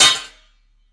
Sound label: target hit